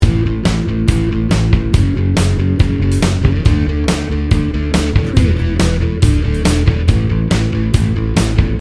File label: happy pop rock loop